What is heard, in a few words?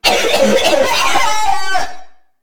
violent coughing